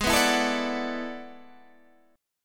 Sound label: E 11th Ab